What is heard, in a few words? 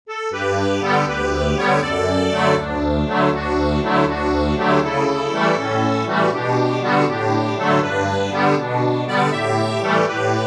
ACCORDION